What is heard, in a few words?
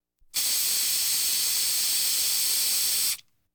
Household Phones